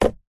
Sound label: vehicles car washer stick flick internal nissan patrol; zapsplat